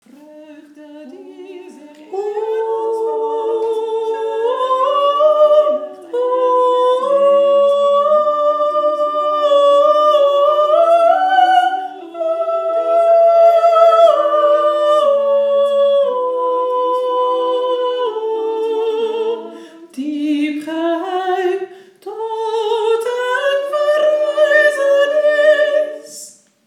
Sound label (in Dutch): licht gleuid sopraan